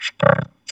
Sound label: VOX VOX, Electro Vox